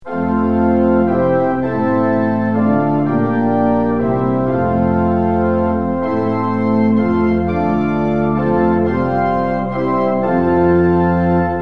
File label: tune; hymns; lillenas